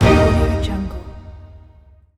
orchestra hit

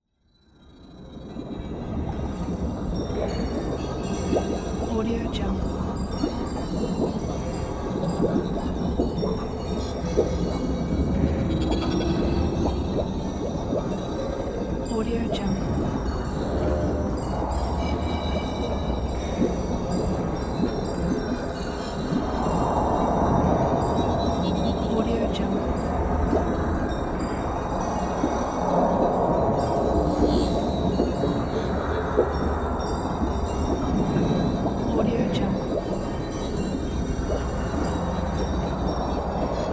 mysterious underground chamber ambience

